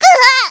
pain severe; voice packs

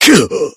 heavy pain sharp

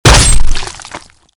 zombi attack

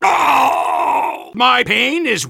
soldier pain severe

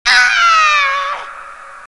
human death female

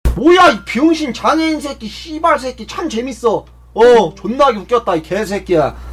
scout laugh long

